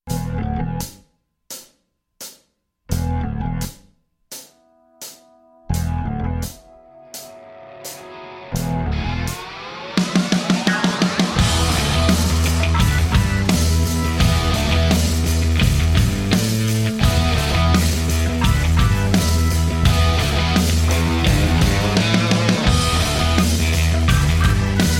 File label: Recording a band with Apogee Quartet